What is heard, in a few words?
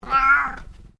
Animal Cat Tonkinese Meow; Domestic cats; Animals